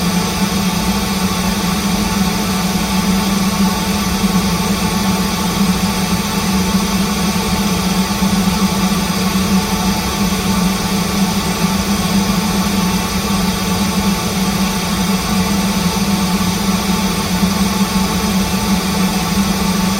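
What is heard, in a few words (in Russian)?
рок музыка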